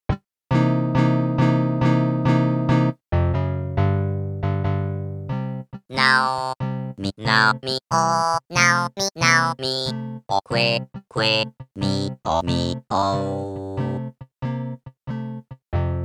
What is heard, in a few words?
Blues; animal crossing k.k. slider complete songs collection